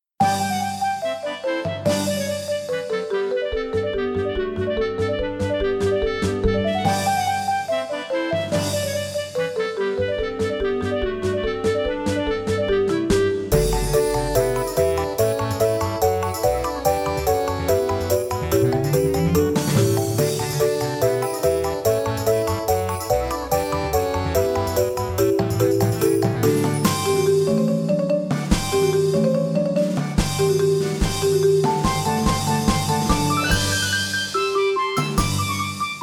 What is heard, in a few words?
Trepak Russian Dance Instrumental; background music